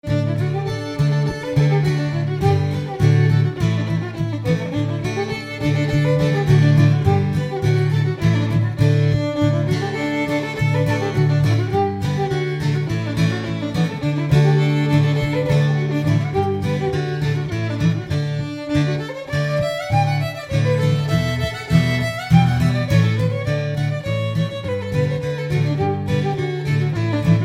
Whiskey Before Breakfast, classical string quartet